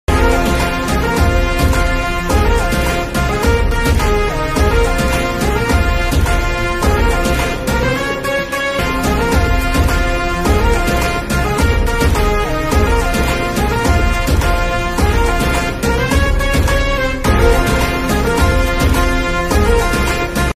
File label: title track bgm background music; toofan; shakib khan